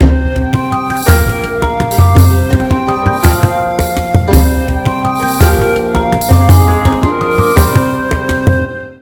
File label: music irish, ringtones; Sony Ericsson; A8i; cmcc